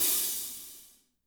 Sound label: 16 HAT, DRY KIT; Spectrasonics; Burning Grooves CD2; DRY KIT 4